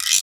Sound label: GUIRO; Univers Sons; Basicussions